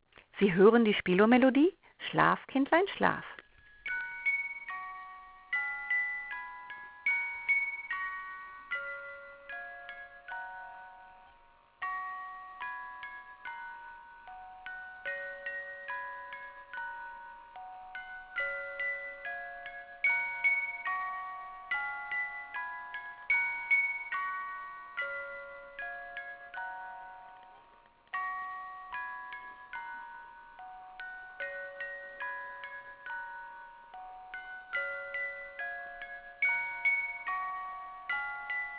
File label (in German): spieluhr schlaf kindlein schlaf; sonne